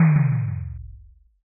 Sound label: impact text